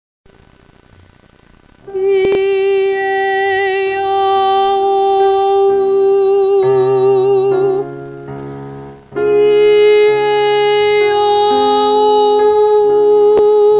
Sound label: Warmup, Vocalization